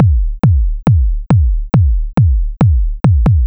Kick Loop, Drums, Dance Beats

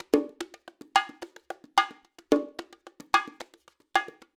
Acoustic Percussion

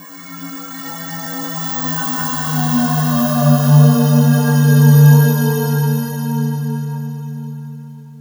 Soundscape; FX

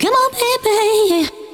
vocals; SoundFactory; SMPMANIA

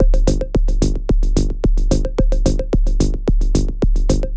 Dance Drumloop; Drums; Dance Beats

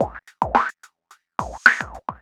Electronica Drumloop; Drums

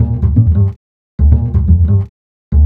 Acoustic Bass, Loops; ACID; Basses

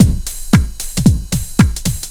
LOOP; SoundFactory; DRUMLOOP

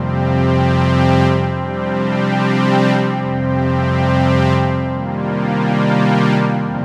Pad, Synthesizers

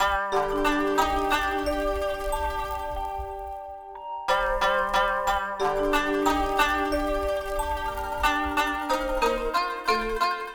Strings

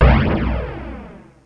C3 CANNON; proteus2

